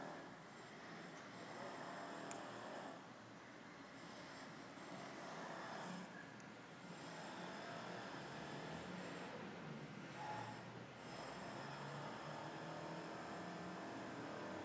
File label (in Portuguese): ouço passos